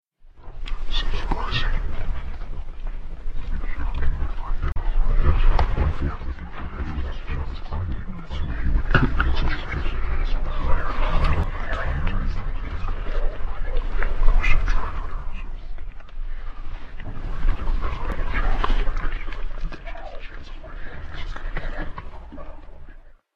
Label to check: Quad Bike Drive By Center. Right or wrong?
Wrong